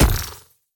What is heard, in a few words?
ui alert stinger